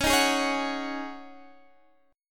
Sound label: Db Suspended 2nd Suspended 4th